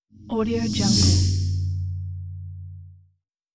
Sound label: short soft whoosh by